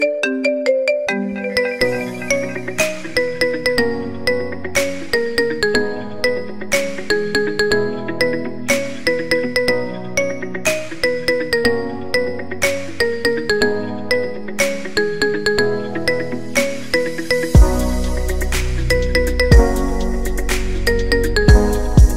iPhone X Official Ringtone

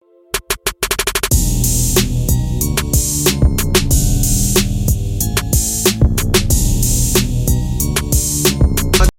Dj Calling Ringtone

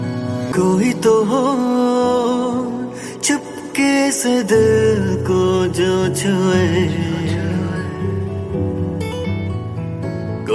Punjabi Ringtone

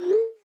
iphone Send Sms Original Ringtone

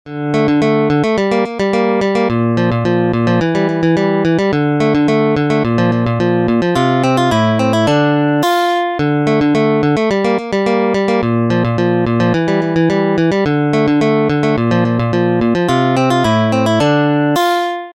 Guitar Solo Ringtone

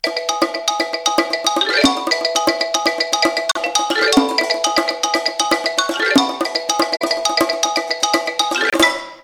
Samsung S3 Ringtone

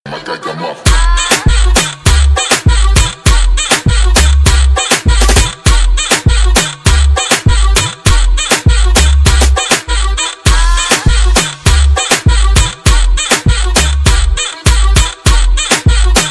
Squeezy Beat, Ringtone; Angry Birds

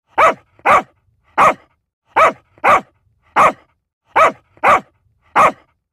Dog Barking Ringtone

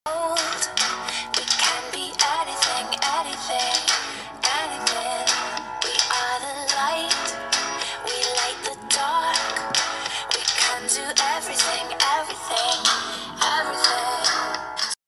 Honor Magic5 Pro Ringtone

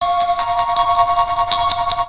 Notification Ringtone

